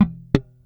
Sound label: SLAP